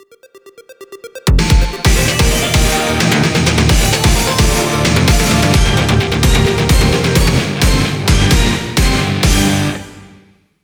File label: level intro crash